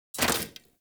Rifle Mech Release; Thanotech

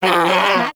Predator Vocal Pain

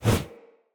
Player Torch Whoosh; Weapons; Blunt

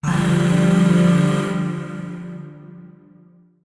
Shawzin Maj Chord E; Warframe; Zariman; Void Shawzin; Chords

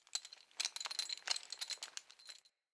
Tent Ring Jingle, Interior; Soul Plane

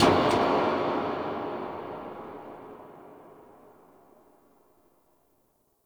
Creepy Metal Swell Clank; Warframe; Entrati; Dante Unbound Leverian

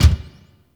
Gear Shift Up, Motorcycle; Warframe; Vehicles; Motorcycle Ninety Nine

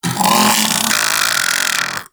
Vocal Death, Infested; Splitter Split Top; Grey Strain

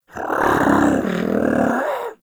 Combat Vocal Idle; Warframe; Catbrow

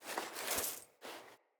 Coin Toss Foley; Level Events; Midrath

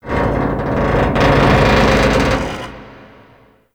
Metal Creak, Ambience; Warframe; The New War; Zariman

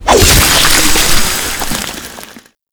Saw Impact Flesh, Blade Impacts; Warframe; Grineer

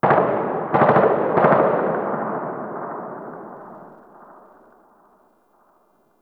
Distant Gunfire; PVP

